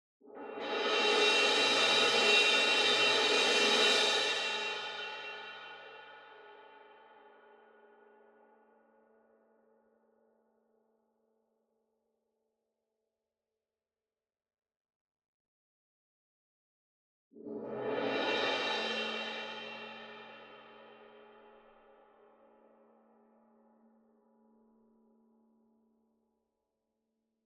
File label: CYMBAL Crash Swell Soft Beater Far; boom library; Cinematic Strikes Construction Kit